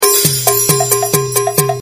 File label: salsa